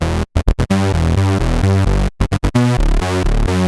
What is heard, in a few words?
egd, edm bass